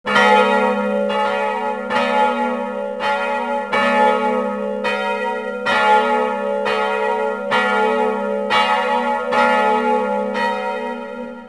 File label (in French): Cloche d Asnieres